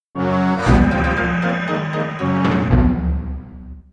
Fight Over; Kooky Courtyard

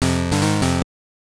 Jingle; Out to Lunch; GB

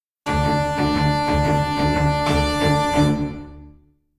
Combat Out; Skellige

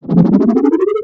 Laser Warm; Tutorial Smith

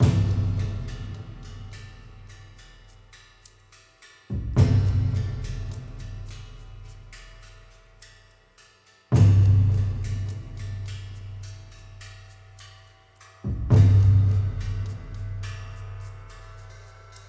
Music Intro

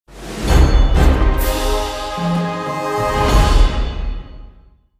Unlocked Item; killzone liberation

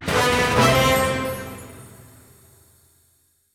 Level Up; portal quest; android

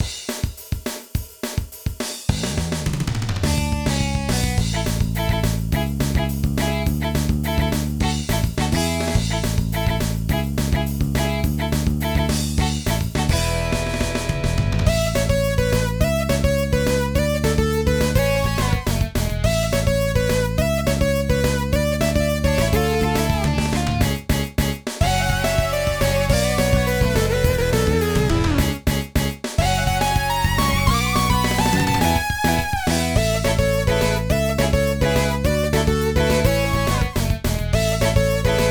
Rock Stage Karaoke; Pinky Street Kira Kira Music Hour Pop Princess; Rock Stage 5

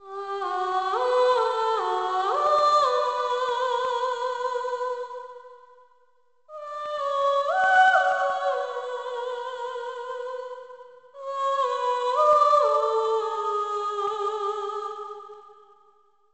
Female Vocal; Tale of Despereaux; NDS

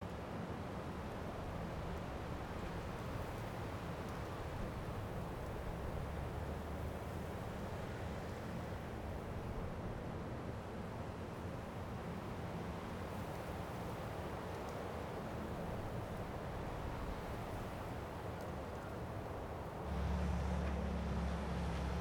amb; HUB; Nepal

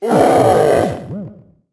ogre pain

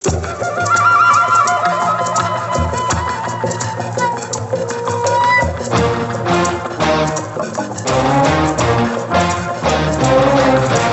Music Jungle Intro; generator rex agent of providence

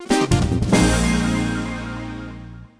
Bad Fanfare; super smash bros. ultimate

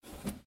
Drawer In; Granny 3; Android

